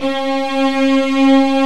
STRING ENSMB; Greytsounds VOL-1

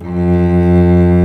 F1 CELLO; InVision Interactive; Lightware VOL-1

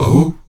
VOX AHOO, Afro Chants, Stacc Ah Oos

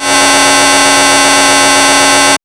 MOOG D 2; AMG - Vince Clarke Lucky Bastard

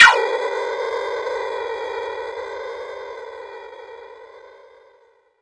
Zap Fuse; Club Techno

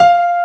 AMB PIANO

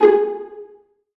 Via Pzz G#3; Digital Sound Factory Virtuoso

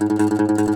DOMRA, Segundo Domra, Plucked Strings

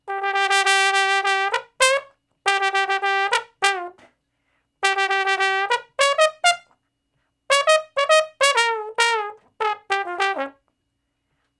Trombone Straight (Bb); Sonic Foundry (Sony Creative Software); Horncraft for R&B; Solos

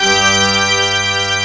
PIPE ORGAN G4; AKAI S-Series CD-ROM Sound Library VOL-8

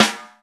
Ping, E-MU Proteus-2000; 300 Drum Machines

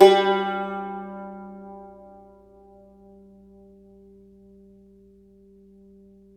CUMBUS G#2

